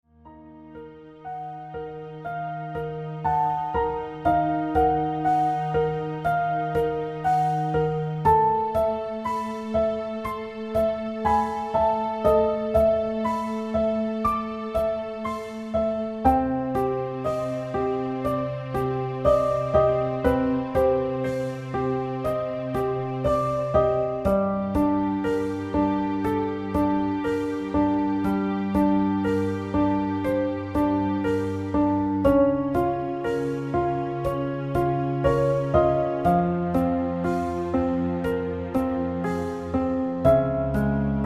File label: Mystic Underwater